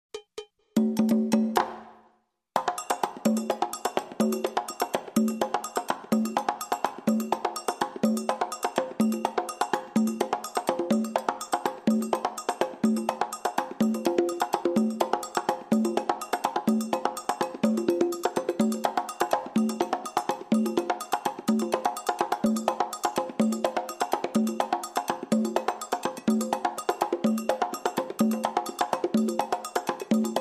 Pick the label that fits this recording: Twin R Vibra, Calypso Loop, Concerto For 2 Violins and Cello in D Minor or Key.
Calypso Loop